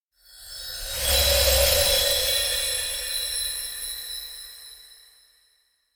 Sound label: elec vanish; rikka; anemoi